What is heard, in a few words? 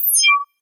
risk mouseover; gambling; Burning Reels; wazdan